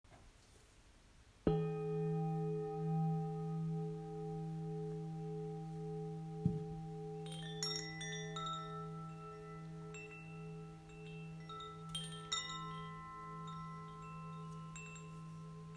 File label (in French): Calice carillon et kigonki